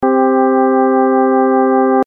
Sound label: interval